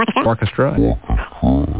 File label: orchestra